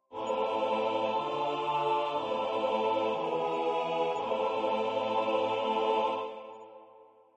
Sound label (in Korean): 주3화음 전체